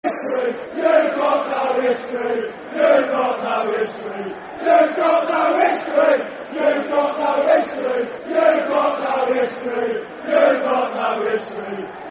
You've Got No History Football Chant (Soccer Song); Millwall Mfc